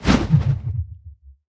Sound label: swish